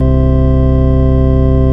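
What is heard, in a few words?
ORG Vox, Continental organ; Keyboards of The 60's and 70's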